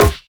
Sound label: basic attack melee; heroes nyru